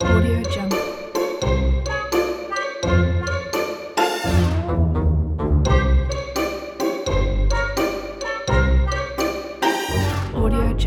cartoon

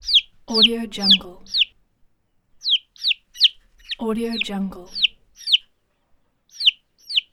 chick

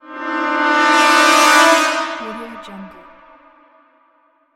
cinematic trailer rise

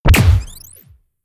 future weapons submachine gun shot; future weapons 3; soundmorph

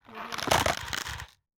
organic horror interface crunchy transition

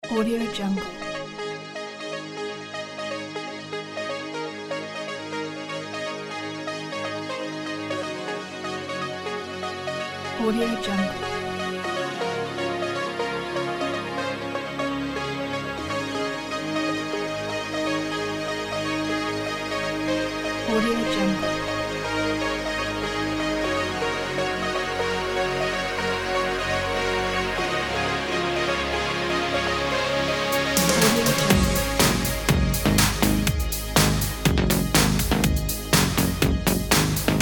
explore science space

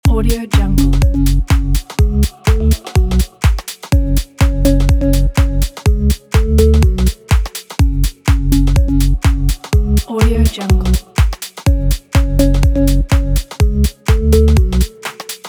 a fashion house loop

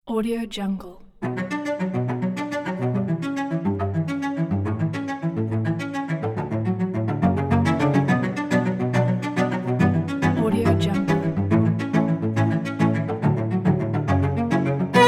dramatic modern cellos and violins

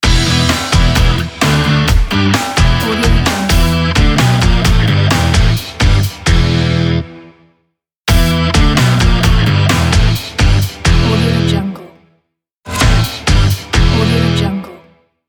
energetic rock modern logo

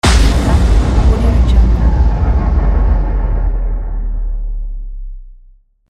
boom

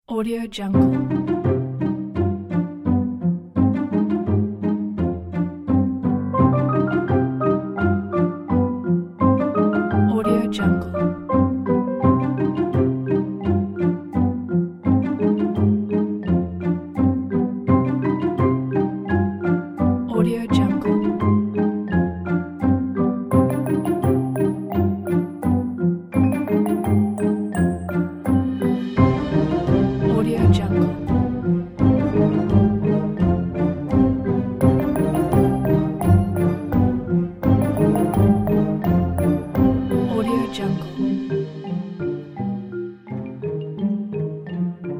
cunning mouse

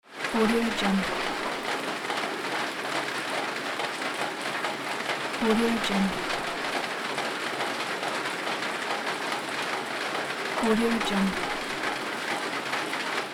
drops of rain are pounding on the roof

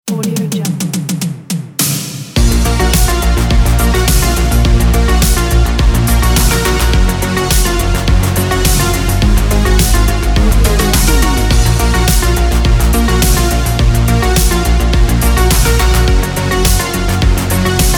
the retro

